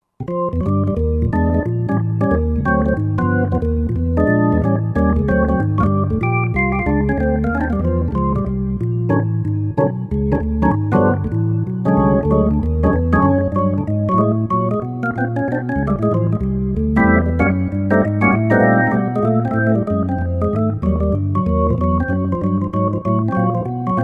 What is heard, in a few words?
Dyno Perc B3; '60s & '70s; Roland SR-JV80-08